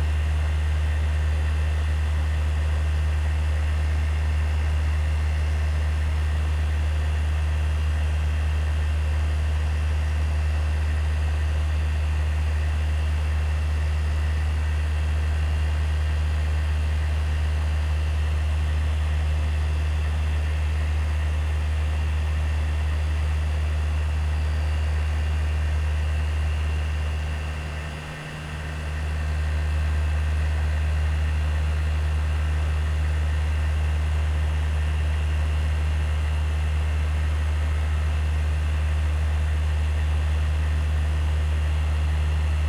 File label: Outdoor AC Unit; Fans and Drones